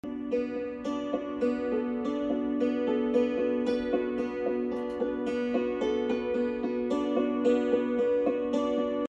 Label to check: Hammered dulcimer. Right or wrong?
Right